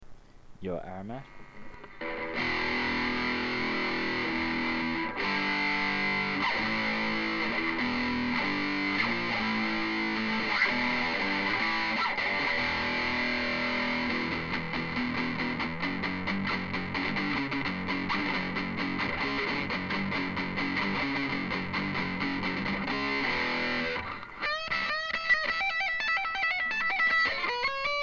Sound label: pedal